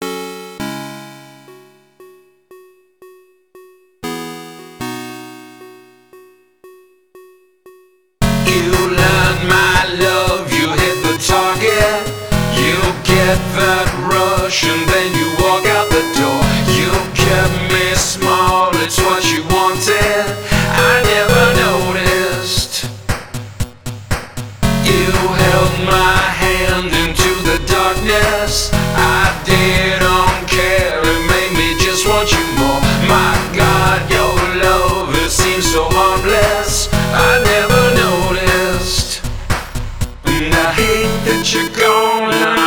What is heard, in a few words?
8Bit Castles